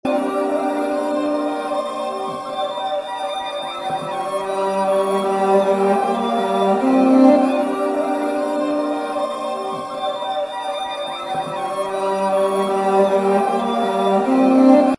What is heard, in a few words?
loop